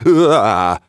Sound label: Arcane Mage hit